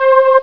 Halo Pad; Prince of Persia 2 Demo

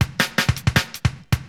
DRUMLOOP D+B 160, Drum+Bass Loop; eJay

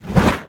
fireball; kult

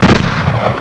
GUN FIR; Doom

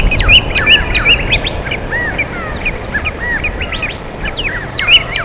Harbour; Vsdemo

